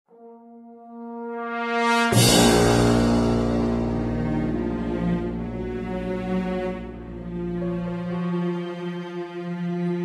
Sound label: sting